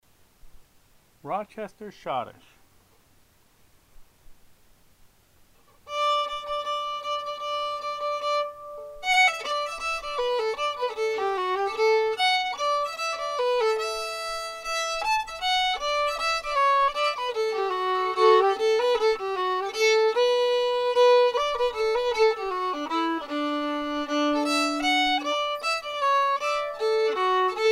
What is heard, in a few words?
Schottische, fiddle; Rochester